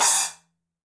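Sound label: Cymbal Finger Stop, Latin Percussion; Drums; Drum Hits; Ableton; Factory Packs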